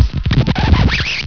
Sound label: zwipp